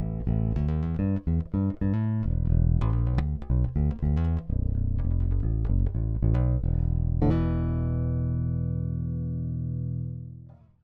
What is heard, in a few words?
Fralin Big Single Active Neck